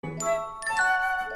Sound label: text tone, ringtones; peter pan; Disney